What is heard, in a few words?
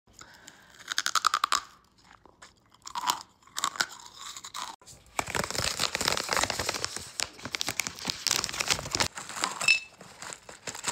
Freeze-dried ice cream, خوردن بستنی خشک